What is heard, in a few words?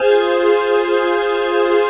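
chord pad, Pads; Best Service; XX Large - Pads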